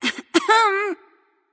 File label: clear throat light; smite; voicepack; hel